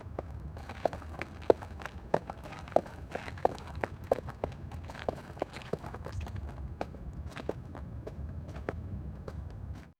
slowly jogging on concret

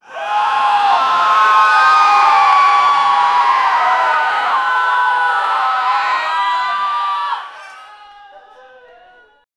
people in the background, panic

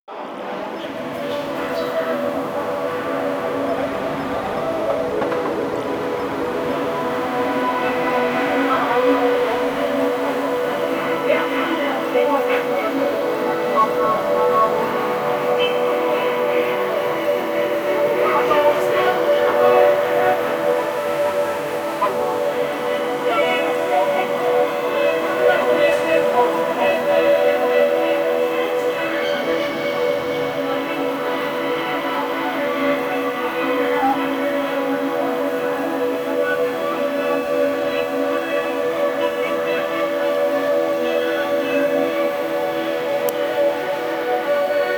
a vibrant marketplace in

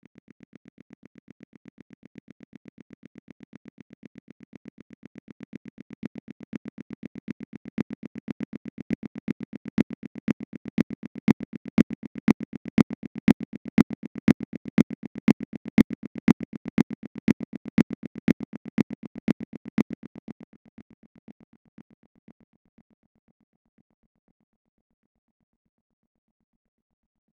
direction finder sounds, signals

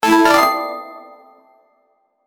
sound effect for platformer

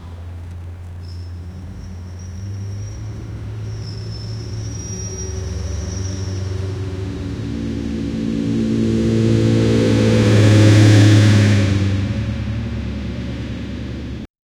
tractor approaches and, approaching

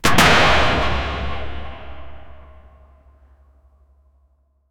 sound of loa, armored vehicles

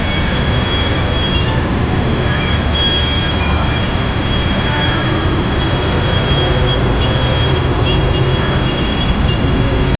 inside a container in a, airport

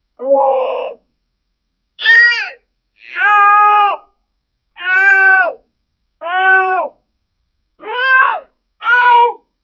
male cat shouting

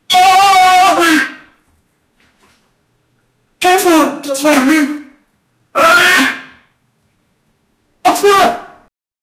man, scream

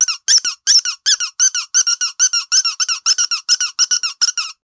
the sound of rubber duck

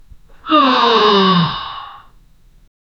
the angry sigh of a; human vocalizations

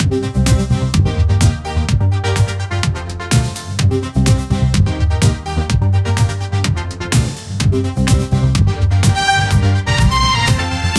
music latin dance

